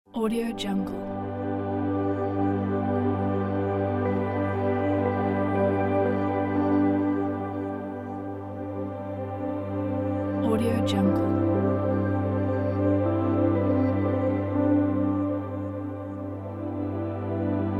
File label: meditation waves